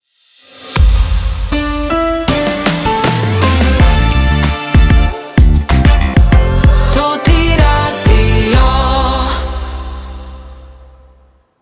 Transition Slow Medium; Toti Radio 2023

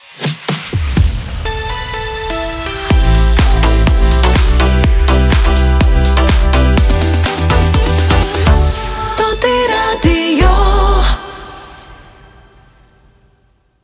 radio jingle hot; Toti Radio

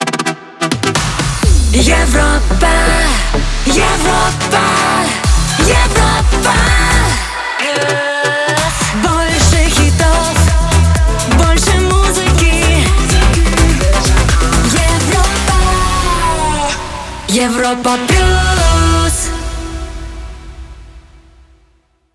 Dance Up Tempo 126bpm e; MIX; Europa Plus 2025

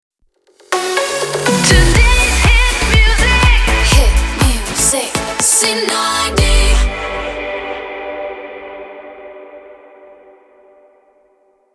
Jingle 122bpm F; Shotgun

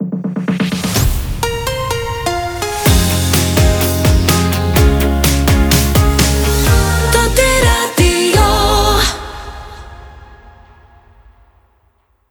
Radio Jingle; Toti Radio

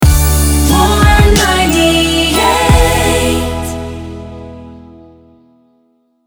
Hot Soft 126 090bpm D Rapid Fire, jingle; Warm 98

